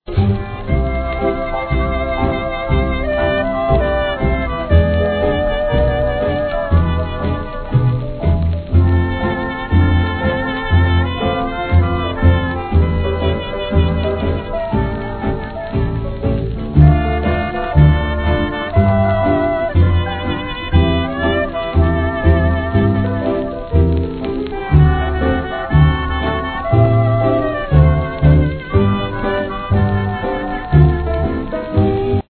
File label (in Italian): ORCHESTRA JAZZ SINFONICO; 78 giri; COLUMBIA